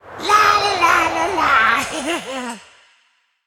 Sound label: Snowman Play